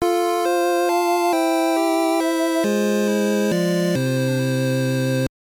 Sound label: Game Over; Super Mario Land